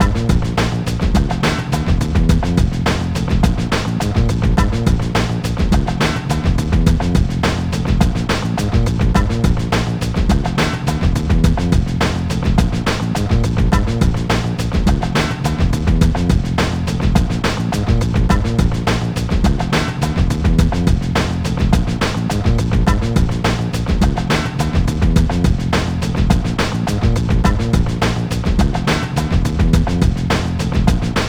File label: Load Loop; NBA Street; GC; PS2